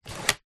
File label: leisure toy plastic slider slide